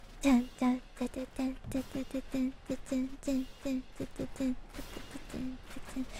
humming; pokemon BGM

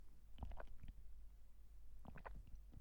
drinking noises

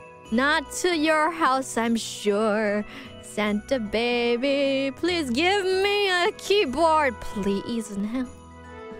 but keyboard, Singing

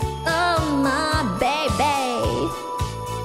oh my bebe

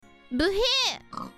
buhi

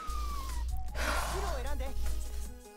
exhale noise

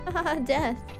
hahaha death

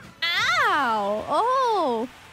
owwww; noises

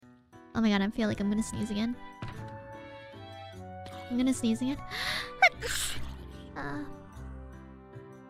Sneeze

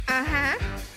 uh hu

